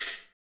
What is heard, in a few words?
RSS PERC